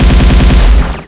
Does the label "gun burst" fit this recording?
Yes